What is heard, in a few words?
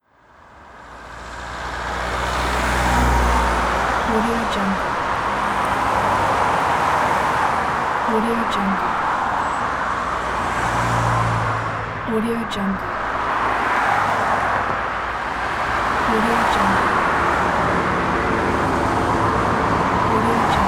urban traffic on the road